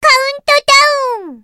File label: readys; osu skins; cedru vax amas